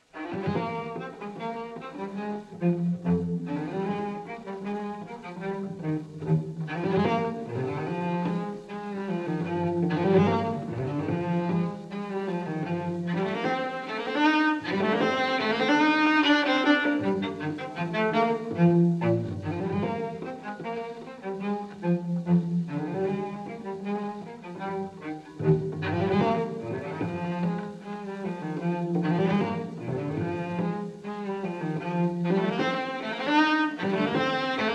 Suites for Solo Cello NO.4 in E-Flat Major V. Menuet I Live; Bach Suites for Solo Cello, Bvw 1007-1012 (Live)